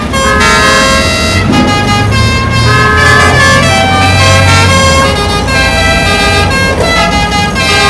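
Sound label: Taxi Horn; Sound Library 2000; Wayzata Technology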